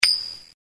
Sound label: coinflip